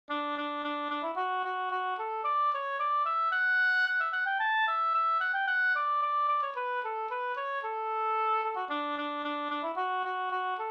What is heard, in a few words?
polka